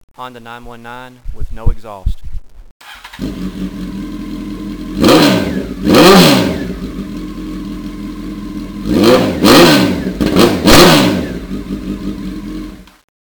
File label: 919 no Exhaust, honda